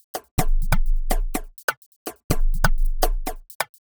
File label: Top 125bpm, House Drumloops